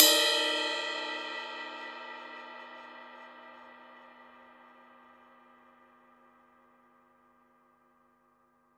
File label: cymbal, deep house single hits